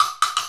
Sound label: The Clopper, Wood Block, percussion; Sonic Foundry (Sony Creative Software) - Essential Percussion